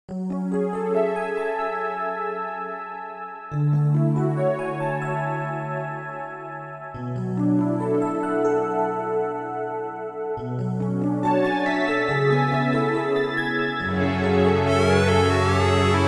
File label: RingTone; iSpazio; iPhone; iOS 26